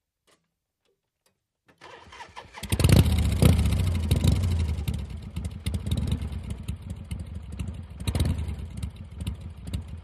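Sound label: Motorcycle Harley Rough; harley-davidson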